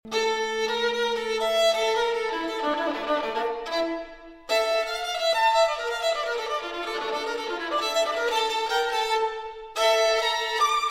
Cadenza Violin

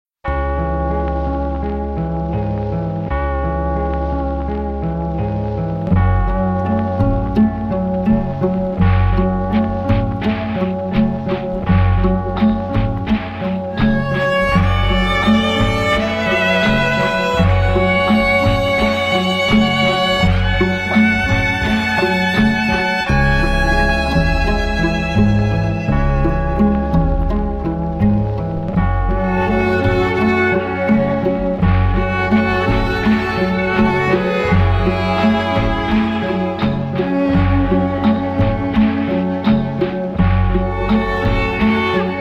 Gongs and Bells Sing

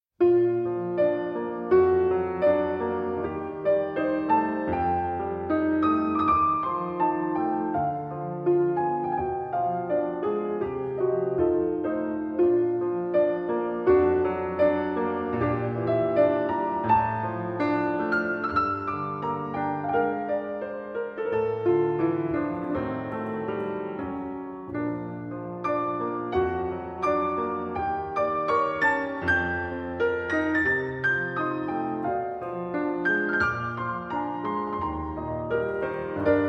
Piano Pieces, Albumblad

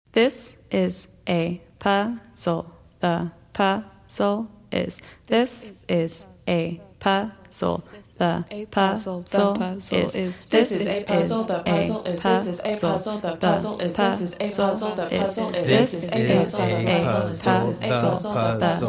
Percussion Trio

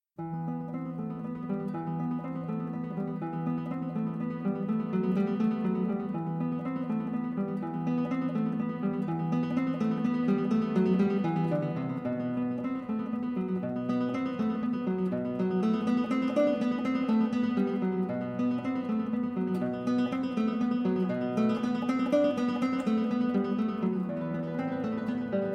Arr. For Guitar; Suite Española No. 1, Op. 47; V. Asturias Leyenda

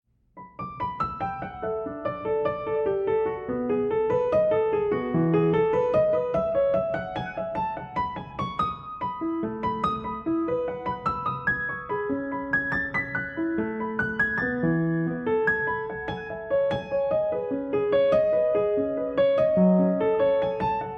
Improvisation

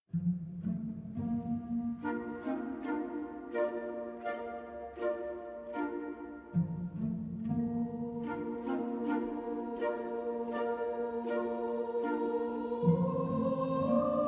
Humming Chorus; Madama Butterfly

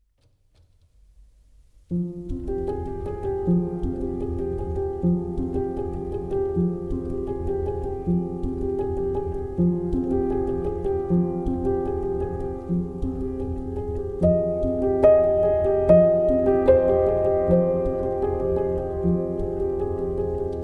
Solo Piano

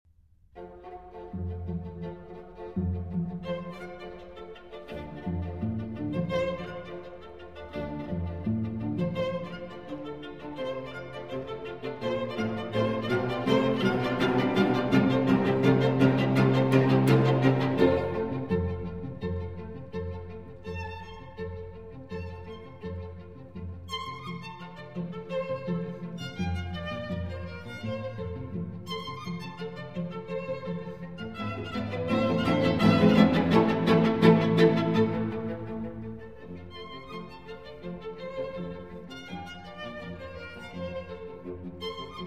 String Quartet in F Major; String Quartet No. 12; Op. 96 - American B. 179; Dvoark

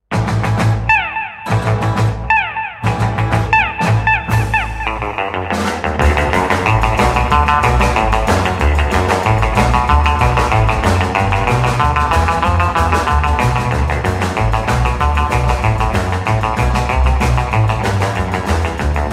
Guitar Boogie Twist

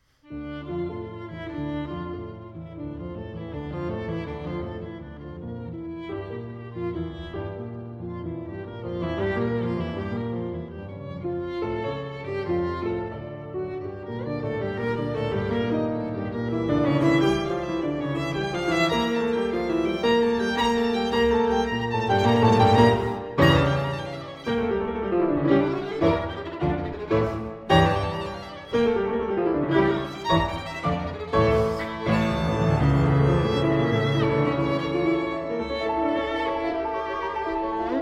violin and piano; Saint-Saens Sonata No.1 For Violin and Piano in D minor, Op.75; 1. Allegro agitato

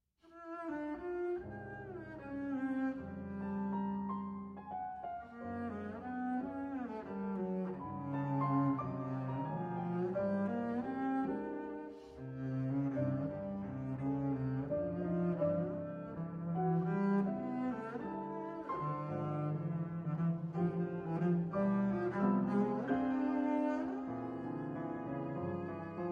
Double Bass and Piano; Cloudfall & Morning Rain

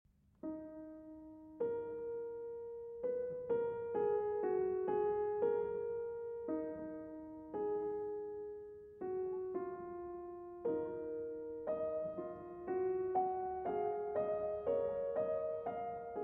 Fugue in E-Flat Minor; Prelude & Fugue in E-Flat Minor, BWV 853

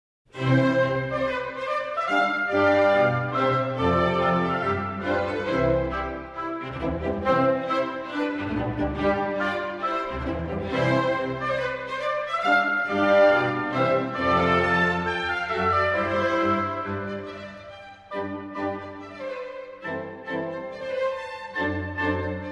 Cello Concerto in C Major, Moderato

